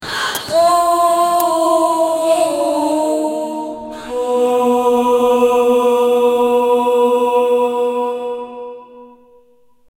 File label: uh-oh